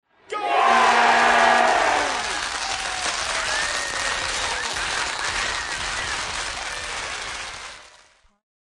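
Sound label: goal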